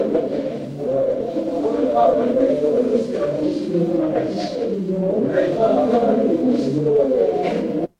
crowd murmur

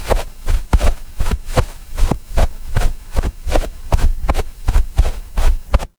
footsteps snow

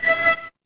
ui power on

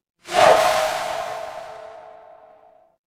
transition swoosh

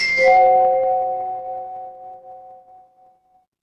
instrument chime bar

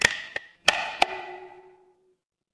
instrument wood block

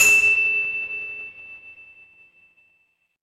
instrument chime bar

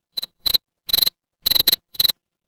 animal insect

